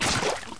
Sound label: gloophit; stinkfly; steam camp